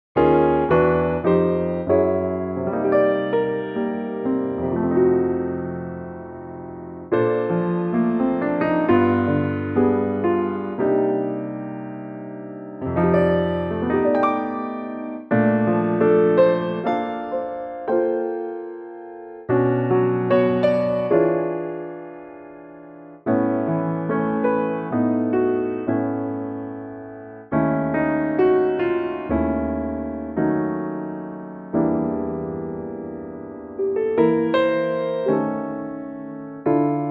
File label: Dm piano; key - Dm; Pianotrak; Really lovely 2017 Piano only arrangement